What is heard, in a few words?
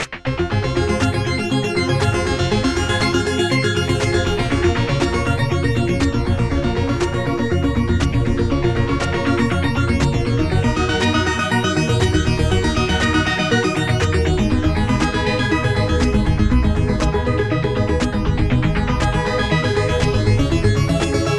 arpeggio